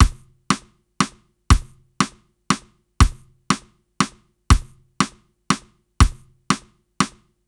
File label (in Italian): Allegretto 120 bpm